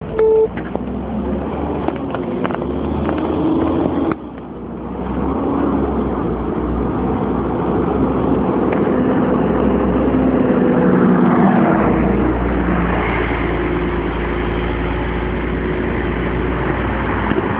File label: passing, bus; kevsters-sound-recordings